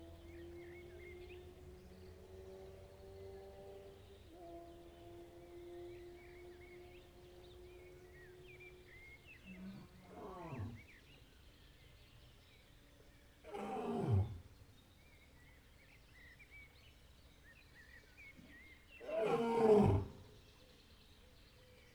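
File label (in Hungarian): bömbölés legelején motorkerékpár, oroszlán; jaszberenyi zoo 2016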